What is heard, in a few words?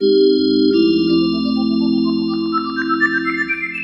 E PIANO 125, Keys; Houseworx